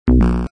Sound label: Lose Shield